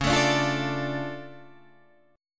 D Minor Db, Dm/Db chord, guitar; Dm/Db strummed; JGuitar

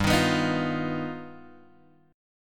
G Augmented 7th G; G+7 Chord {3 X 1 4 4 1} strummed

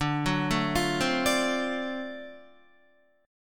D 7th Sharp 9th D down Guitar; D7#9 arpeggiated; JGuitar

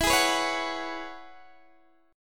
E 6th Add 9th E down Guitar DADGAD

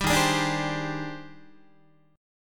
D sharp Major 13th, down, Guitar; strummed; JGuitar

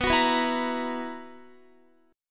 B Major 7th Suspended 4th, Guitar chord; strummed; x,14,16,16,16,17

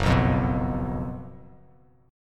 Gb Major 7th Suspended 4th, down; strummed; JGuitar

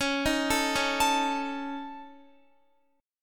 Db Suspended 2nd Sharp 5th Db Guitar; arpeggiated; Dbsus2#5; JGuitar

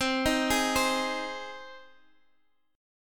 G sharp Major C

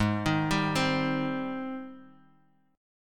Gsharp Major 7th, Guitar, Open D; 6,6,5,6,x,x

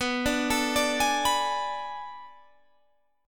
B Minor 13th B down Guitar Open G

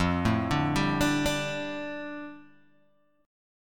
F 6th Add 9th Guitar Open G, chord

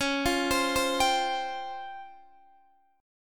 Db Major 7th Flat 5th, Guitar Open D

